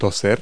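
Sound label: cough; Multi-Lingual Talking Picture Dictionary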